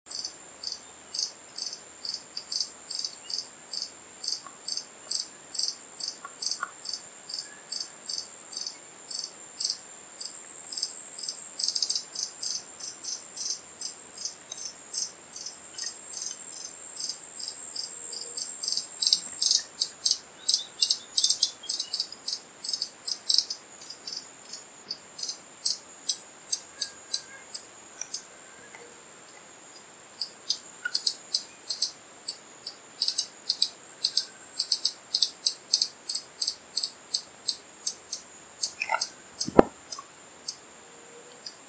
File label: Horned Sungem, پرنده